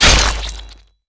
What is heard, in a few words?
sword